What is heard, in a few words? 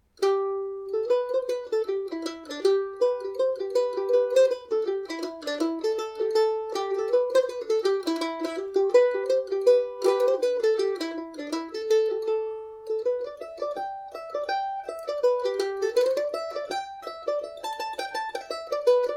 reel, Irish mandolin; joe banes